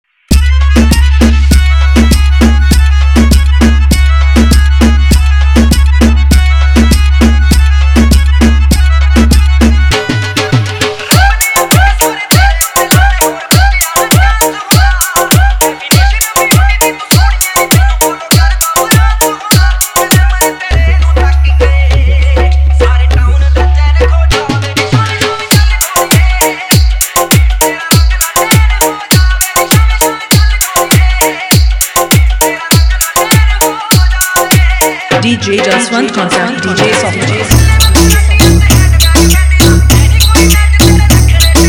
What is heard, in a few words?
Remix Punjabi Song